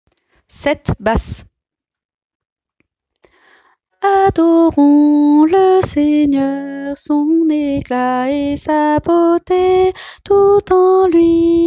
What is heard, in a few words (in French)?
basse